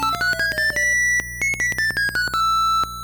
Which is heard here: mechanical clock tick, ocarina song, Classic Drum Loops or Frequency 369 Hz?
ocarina song